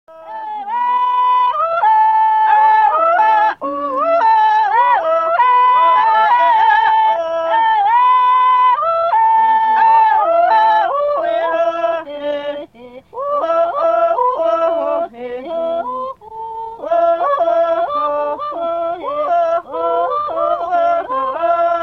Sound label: Juhoansi Giraffe Dance